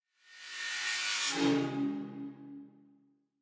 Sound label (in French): Benedictus Sopranos